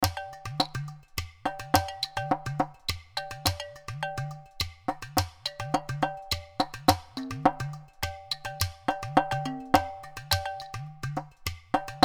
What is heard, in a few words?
Perc